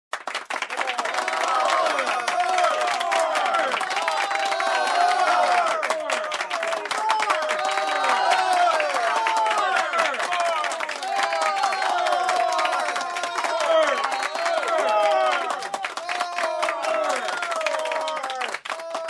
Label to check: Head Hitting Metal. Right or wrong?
Wrong